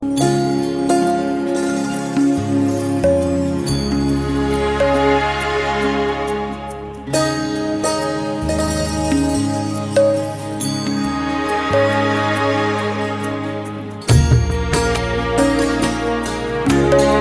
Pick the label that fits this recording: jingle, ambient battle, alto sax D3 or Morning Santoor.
Morning Santoor